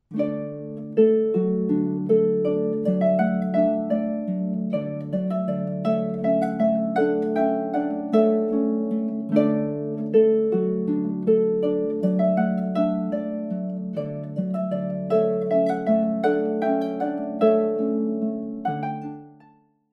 minuet handel partial fade out

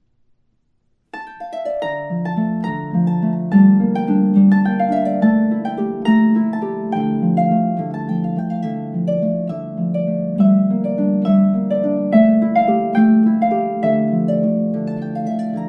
south american example

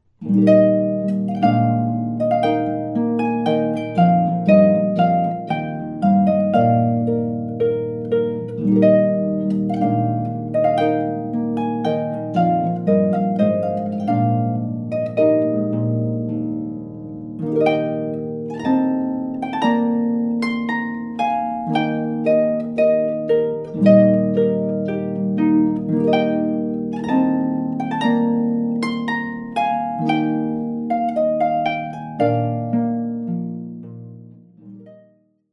trumpet voluntary

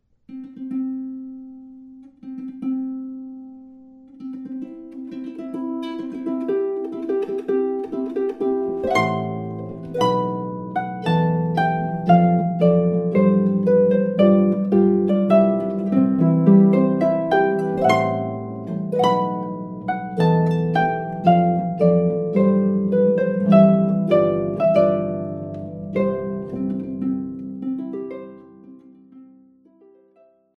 wedding march

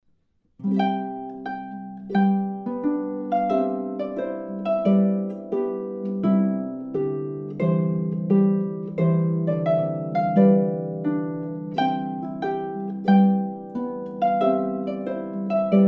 trumpet tune fade out

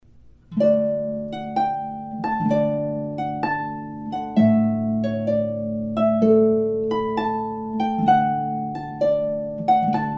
classical example, fade out; largo vivaldi

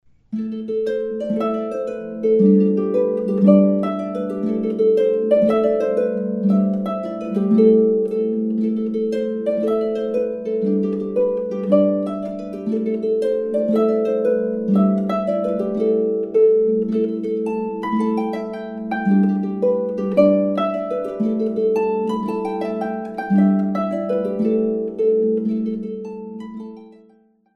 celtic example fade out; man from dunmoore faster normalized

